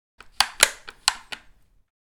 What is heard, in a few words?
Sniper rifle foley putting safety on